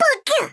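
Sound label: squeak die; ragemap2019; giegue; poppo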